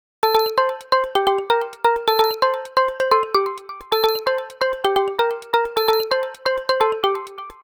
Sweet Tone, Ringtones; Samsung; Gear S

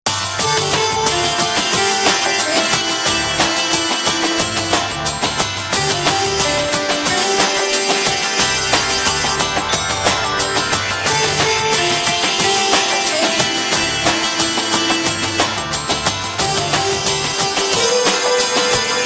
Belly Dance

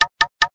Knock, notification; LG; V31-isai-VL

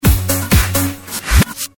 Message tone

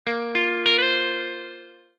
Guitar, notifications; Honor; X5

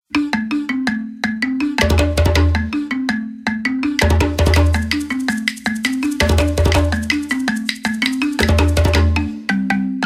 Djembe Jig; Microsoft; Lumia 535 Africa

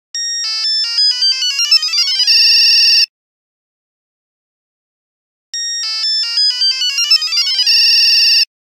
5110 Scifi, Nokia Buzzer; Windows Phone apps

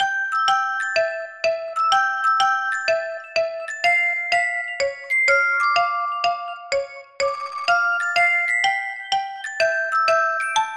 Music box, ringtone; BQ (Russia); BQS-5050-Strike-Selfie

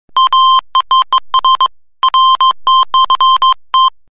Code Morse, ringtones; Sony Ericsson

